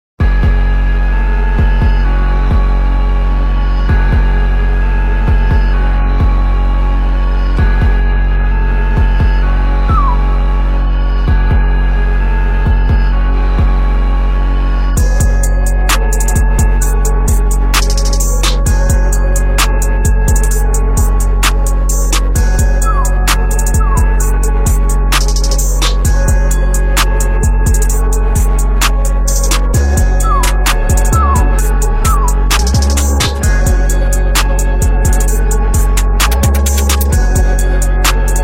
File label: INSTRUMENTAL